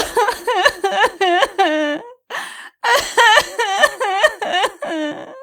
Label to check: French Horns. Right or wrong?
Wrong